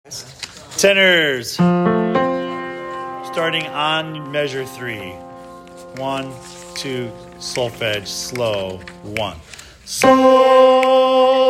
Tenor Alleluia slow on solfege

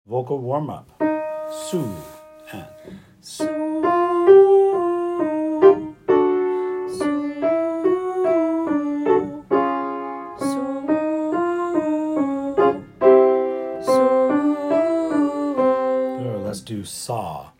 Vocal Warm Up

